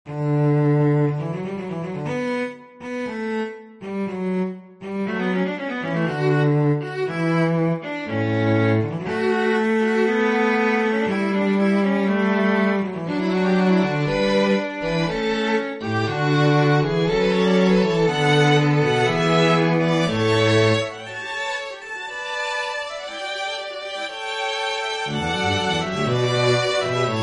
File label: Fugue in D major; Bach; computer generated from our quartet scores